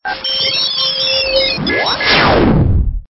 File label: Stinger; STINGERS